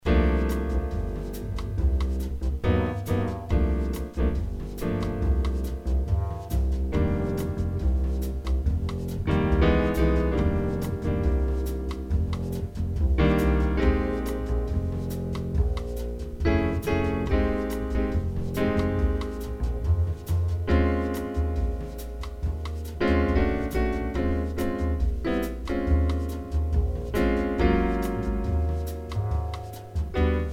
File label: Cycle 5 Sus 4, Cycle 5 dominant 7th sus 4